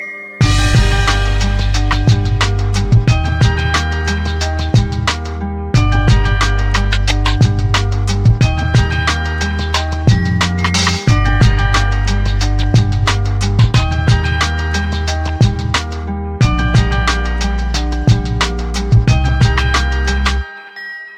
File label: hip hop beat